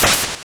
Whip, attack sound; カタナ１

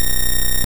laser, 8bit; カタナ１

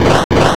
hit, attack sound; カタナ１

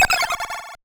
Other attacks, 8bit; カタナ１

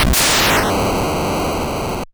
finishing blow, attack sound; カタナ１

